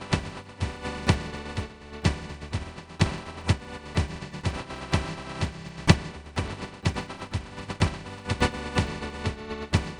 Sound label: drums & backround; Sound Source Separation Using Sparse Coding